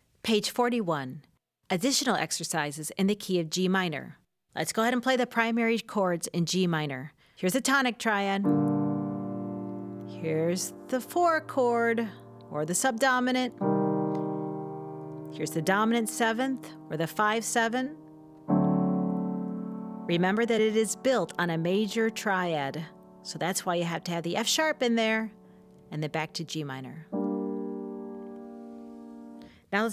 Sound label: G Minor Chords Cadence And Arpeggio